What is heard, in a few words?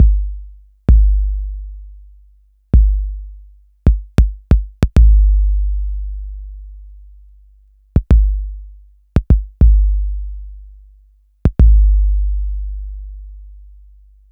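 TR-808 bass drum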